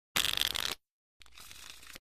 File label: Cards Shuffle Deck; casino games